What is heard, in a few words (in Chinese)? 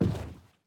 船在陆地划桨声